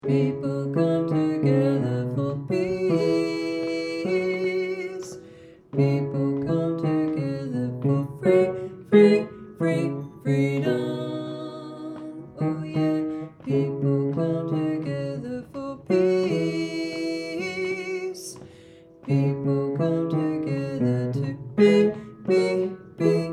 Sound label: bass, voices